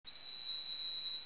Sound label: trumpet